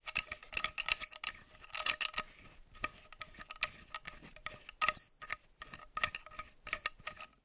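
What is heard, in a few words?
industrial metal nut turn loose on pipe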